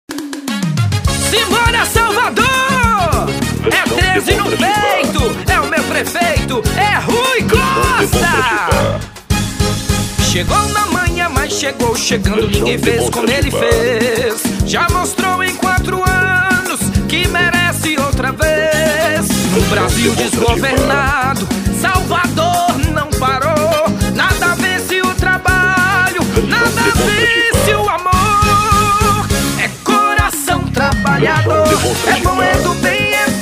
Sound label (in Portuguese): jingles politicos; rui costa 13 prefeito